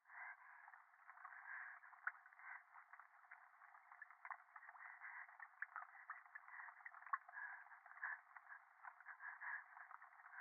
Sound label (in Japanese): 電話 右耳快感吐息 雨上がり